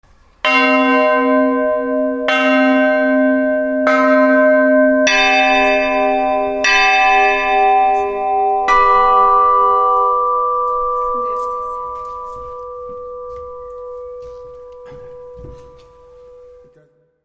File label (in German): glocken; sehenswuerdigkeiten